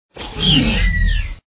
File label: attack